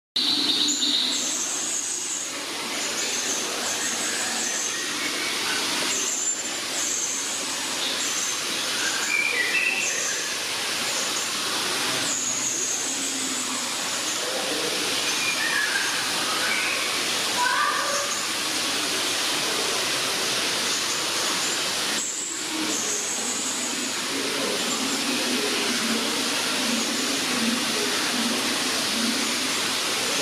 blaukronchen loriculus galgulus blue crowned hanging parrot zoo; zoo schonbrunn; serindit; lumix dc fz82; visyachie popugajchiki